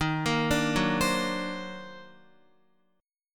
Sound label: Eb Minor Major 13th, Guitar; arpeggiated; chord; x,6,8,7,6,7; JGuitar